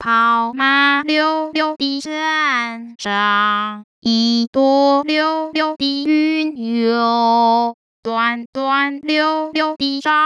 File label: madrigal, vibrato; KangDing